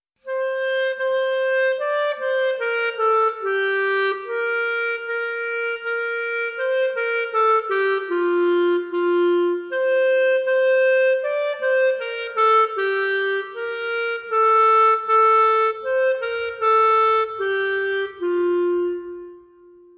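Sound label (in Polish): klarnet, clarinet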